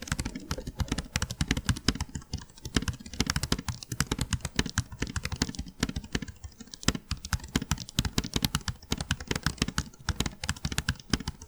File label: new keyboard